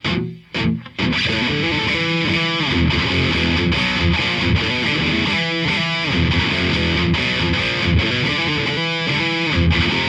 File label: Riff Tapes